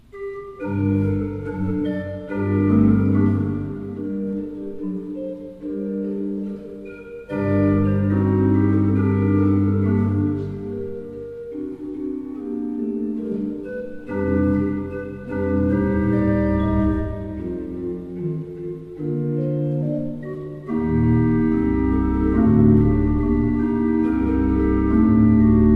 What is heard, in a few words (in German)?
Fuehrer, gebrauchtorgel